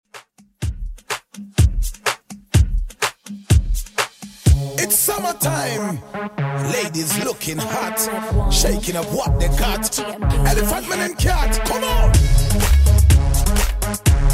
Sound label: 2ks Redrum 125bpm